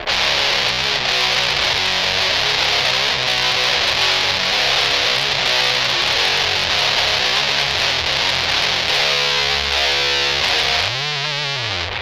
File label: tone max mod bigmuff; tonestack bigmuff